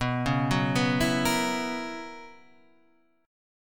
B Major 7th Suspended 2nd Suspended 4th, down, Guitar, Half Step Down